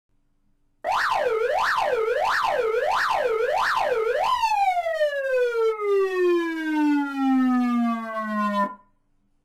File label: YELP